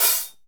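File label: hi hat; HH-Kits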